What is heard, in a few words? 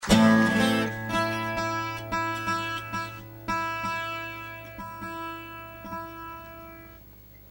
tuning